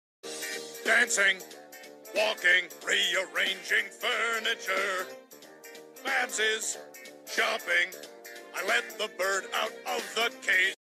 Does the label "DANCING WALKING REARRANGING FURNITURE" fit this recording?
Yes